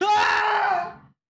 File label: Wilhelm Scream 2.0; Foley Library; Danger Sounds; Let's Learn Everything!